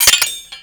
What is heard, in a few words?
Grineer Four Barrel Rifle mech